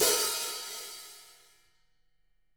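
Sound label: hats open; Sonic Reality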